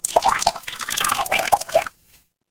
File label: water swirl